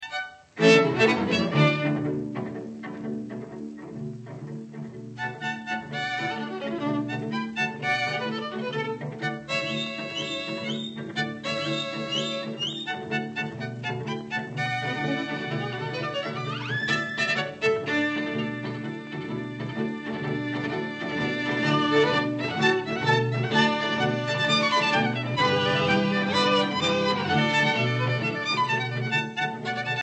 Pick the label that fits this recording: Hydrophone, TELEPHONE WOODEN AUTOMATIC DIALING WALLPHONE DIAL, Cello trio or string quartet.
string quartet